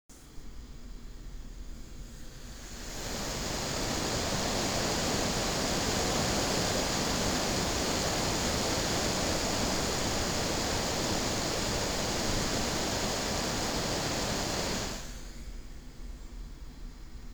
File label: dual phase fan